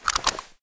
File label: ammo pickup